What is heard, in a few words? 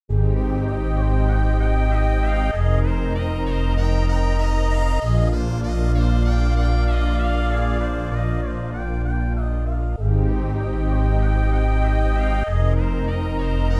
Forest Interlude